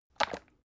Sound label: bottle syrup upend glug